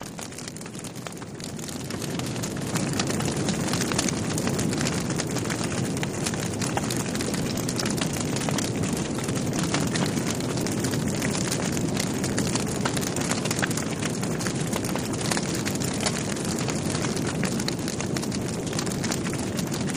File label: Fire Crackle Med, fireplace